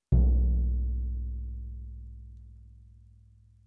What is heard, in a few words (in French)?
Surdo batte main droite resonnant